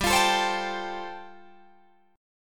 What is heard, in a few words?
G 9th Suspended 4th, Guitar Drop D